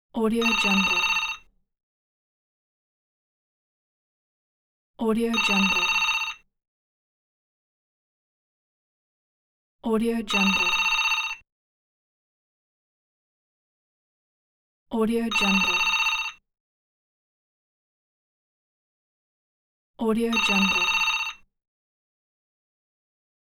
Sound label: office phone ring